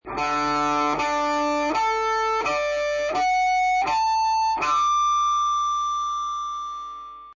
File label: open string harmonics